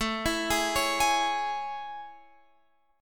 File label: A Major 7th A